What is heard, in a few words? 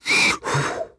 hp grunt